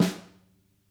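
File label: snare, Interactive Drum Kits; Sonic Reality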